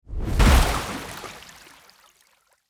water splash

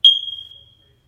smoke detector

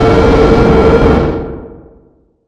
jumpscare; five nights at stellas 4

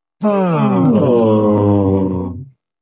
aww; puss in boots

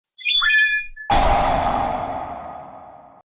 card sound; yu-gi-oh

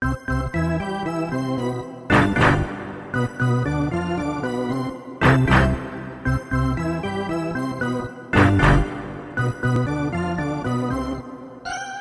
piano

